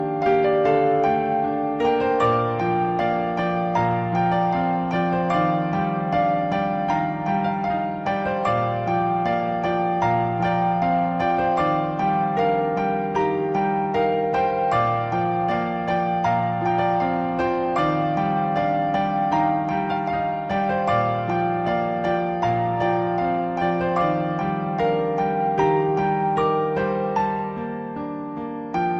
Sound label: Piano